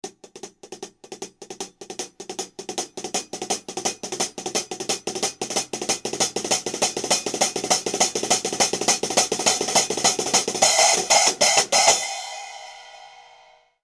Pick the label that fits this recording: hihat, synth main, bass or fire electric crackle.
hihat